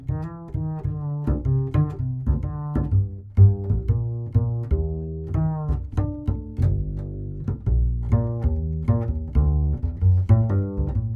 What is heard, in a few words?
Bass